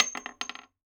Shell Wood

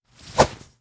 Punch Kick; Melee; Body

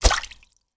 Bullet Impact Water

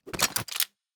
Pistol Equip

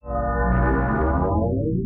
Teleport End

